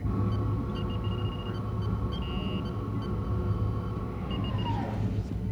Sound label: Ops Turbolift; trek